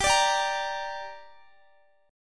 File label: G Minor G down Guitar, chord; Gm strummed